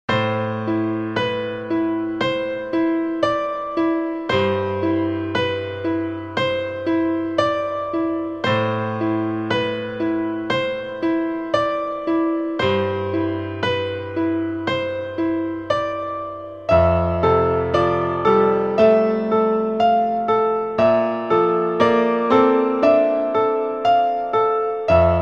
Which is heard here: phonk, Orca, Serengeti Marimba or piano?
piano